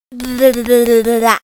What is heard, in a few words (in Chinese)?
女挑衅